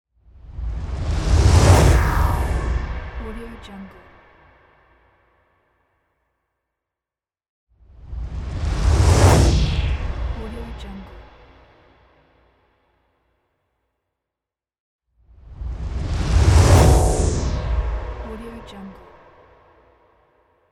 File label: hybrid whoosh; transitions and movement